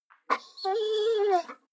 dog whine low; natur-tiere; hunde

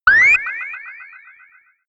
synthetic hits; swooshes-swishes

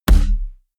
heavy saturated, hits impacts

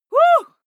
female cheer woo

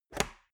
metal box small close; maschinen; soundpool

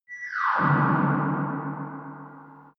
metal whistle; swooshes-swishes

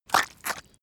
step squishing; schritte

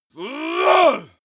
male groan strain; menschen; schreie

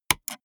gaming mouse left click single hard; maschinen; buero

